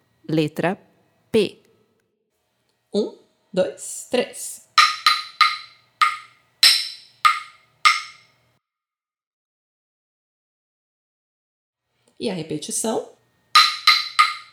PERCEPCAO Ritmo Semelhancas e Diferencas

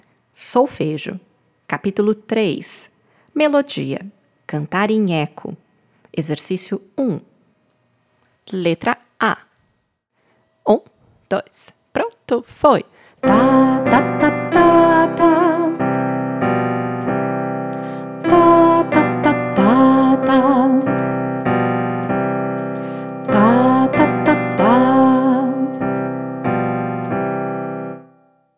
Melodia Cantar em Eco; SOLFEJO

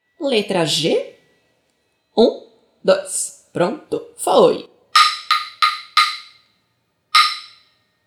Ritmo Ditado Ritmico; PERCEPCAO

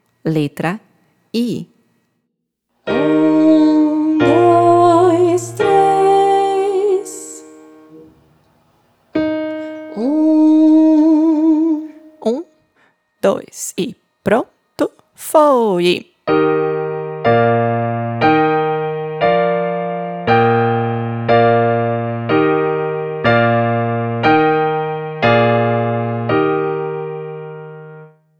Solfejo Melodia Solfejo Melodico

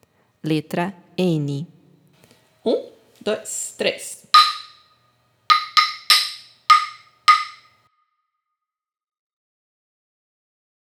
Ritmo Semelhancas e Diferencas; PERCEPCAO

